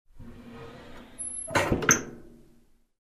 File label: Closing metal compartment with sliding door